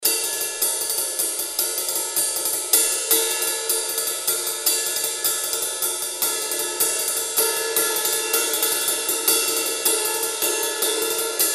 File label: 16th note ride 150bpm